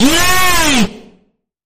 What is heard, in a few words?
Sharp Bend, F sharp; Sharp Bend Ahead